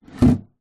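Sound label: industrial crate plastic set down concrete ground; industrial create plastic set down concrete ground; zapsplat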